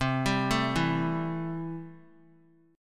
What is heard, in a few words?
C 6th C down, chord; C6 arpeggiated